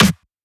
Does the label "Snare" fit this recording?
Yes